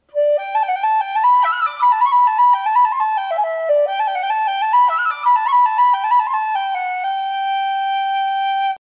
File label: wood whistle